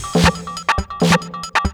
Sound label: drum 138, drum-loops, loop-grinder, Techno